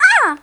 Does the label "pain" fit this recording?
Yes